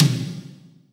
Toms Real

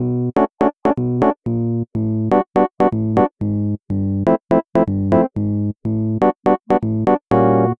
keys, Phonky loops

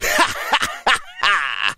laugh

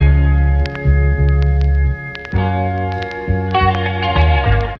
keys old skool organz, Phonky loops

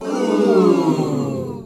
"ooh" audience; ooh ahh

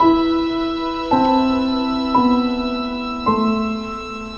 piano, Loops Sad

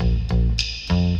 bass, Loops Phonky

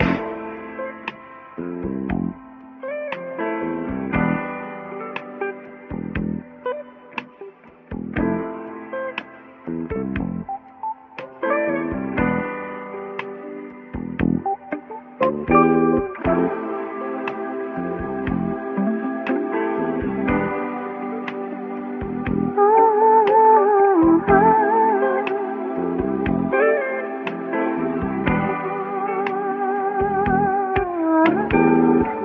keys and guitar slow jamz; Loops; Phonky

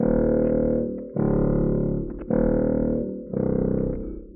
bass grim, Loops, Sad

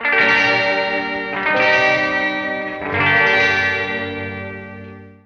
guitar, Loops

